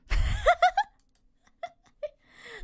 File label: Laugh; IRyS